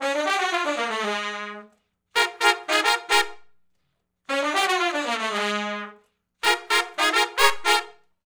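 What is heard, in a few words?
R&B Riff (Bb) unison, harmony, Horncraft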